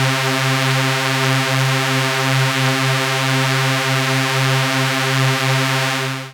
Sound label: Lead C3; 90s Sample CDs; Club Techno